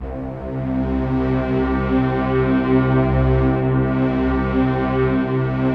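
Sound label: Orchestral Choir Stack; Optical Media International; Sonic Images Library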